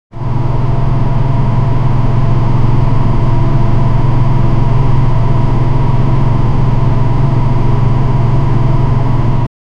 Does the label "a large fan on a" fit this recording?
Yes